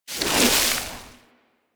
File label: Hoverboard Terrain Caster Snow; Warframe; DE; Vehicles; Venus